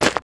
Normal Step Right; Movement

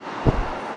mob run, Monster; Bossmob; 금전방; 금적산

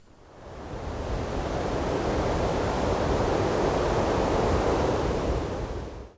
wind tree; EnvSound

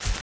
Ground Step Right; Movement

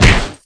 MOB BATTLE WOODSTICK, Battle Mob Weapon

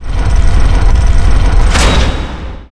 DOOR DIE

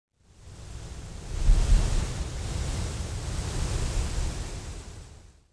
wind full leaf; EnvSound

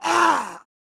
Mob die; Monster; 흑수사; 수행승

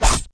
MOB BATTLE CLAW, Battle Mob Weapon

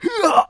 hangin

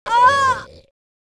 Mob die; Monster; 묘족; 낭아장궁수

